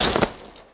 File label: Skell Soldier Cloth; Legend of Keepers (gamerip) (2019)